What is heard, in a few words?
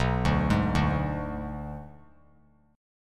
B Minor 6th B; Bm6 arpeggiated; JGuitar